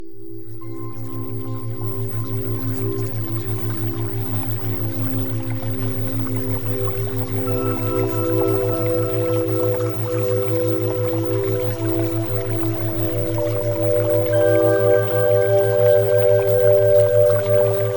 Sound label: Music Theta Waves; Premium Subliminals; Success With Men